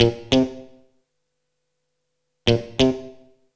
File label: GTR, Funk; Hip-Hop & R&B; USB Soundscan vol.47; AKAI